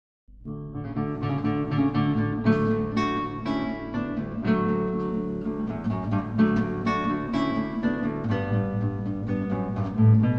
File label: tri jingle, guitar